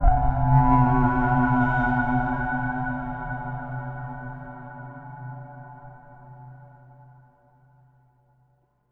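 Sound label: monastery, temple bells; Spectrasonics; Bizarre Guitar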